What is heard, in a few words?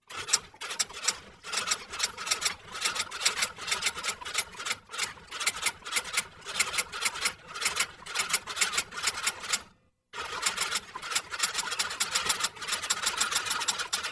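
typewriter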